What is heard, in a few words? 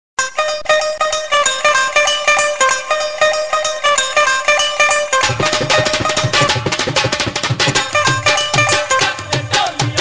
nokia 1100 punjabi